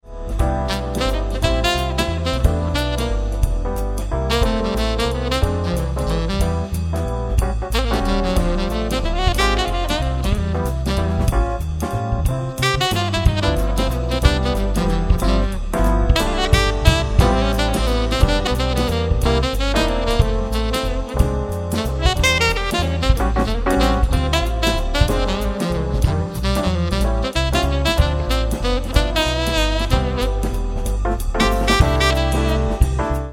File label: Solo